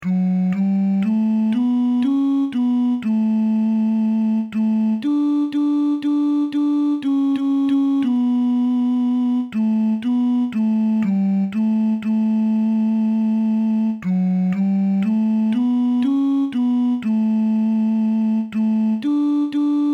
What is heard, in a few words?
Lamb of God Tenor; Holy Cross